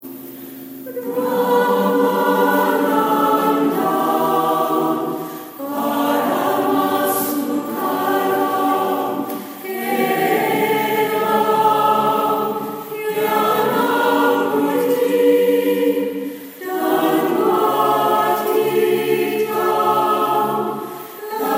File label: Hymn to Brahma choir